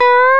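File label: B-C# STEEL GUITAR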